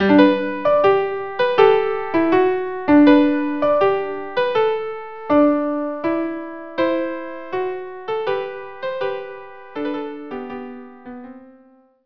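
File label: violin unaccompanied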